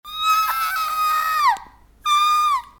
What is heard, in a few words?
high pitch scream